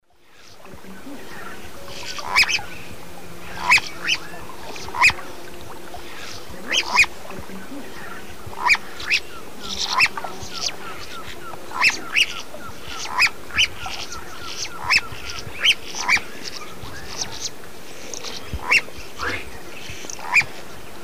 Hydropsalis climacocerca, alarm calls; S. America; Eastern Lowlands

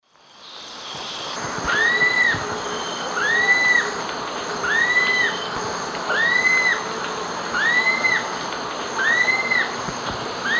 Galbula chalcothorax, calls; S. America; Eastern Lowlands

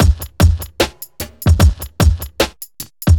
hiphop

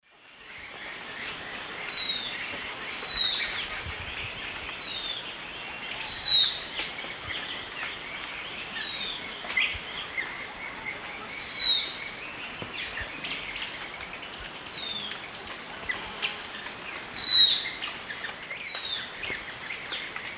Pithys albifrons, songs pair; S. America; Eastern Lowlands

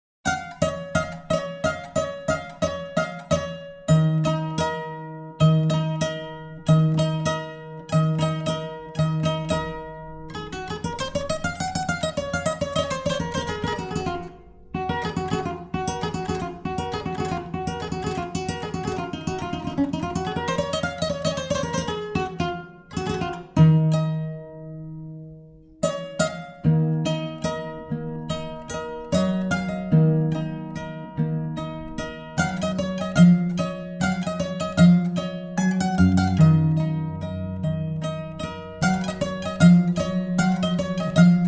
Desert Blues World; World Music

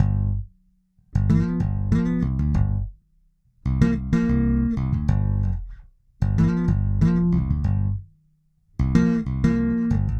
Bass Hip-Hop

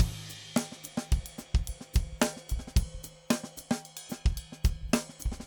funk 110; odd loops

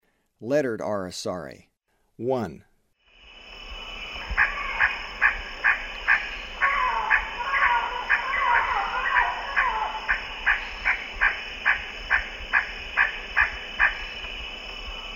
Lettered Aracari; S. America; Eastern Lowlands

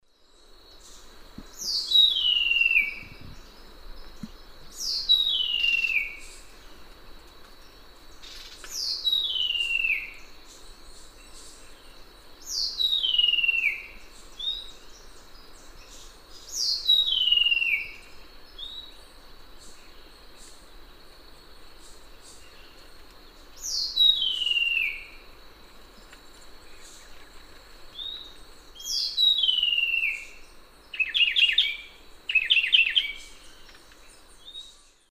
Henicorhina leucosticta, song; S. America; Eastern Lowlands

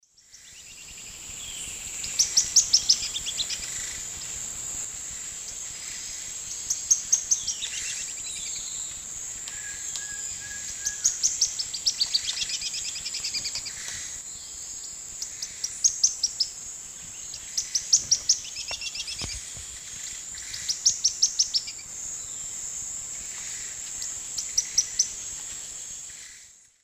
Xenops minutus, song or call; S. America; Eastern Lowlands

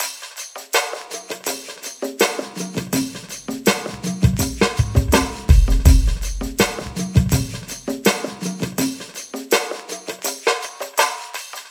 DOWNTEMPO BEATS

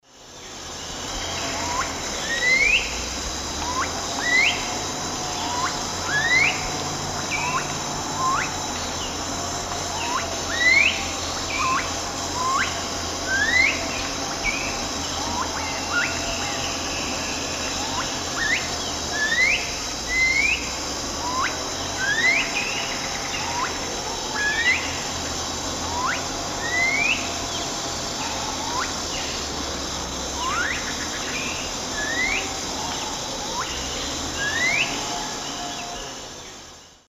Pipile pipile, song-like calls; S. America; Eastern Lowlands

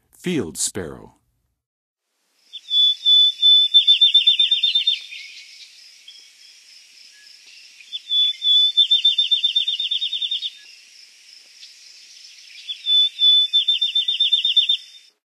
Field Sparrow, Songs of Birds of North America; Western USA; Western USA Songbirds

